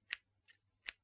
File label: office scissors cut air slow x2; sound effects one